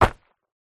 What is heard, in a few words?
footstep single jump land dirt track